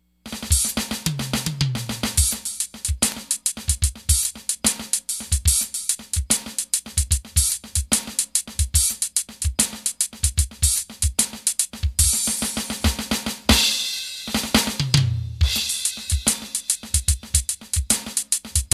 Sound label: drum; Porcaro Shuffle